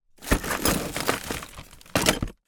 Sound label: industrial toolbox full tools rummage